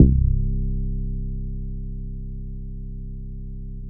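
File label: BASS, left; East West - Phat & Phunky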